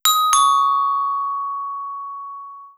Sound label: Glockenspiel Glockenspiel, Deurbellen; 500 serie